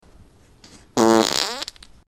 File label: stinky fart at party